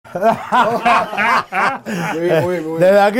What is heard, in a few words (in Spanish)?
risa